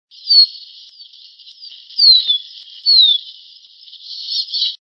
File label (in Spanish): capuchino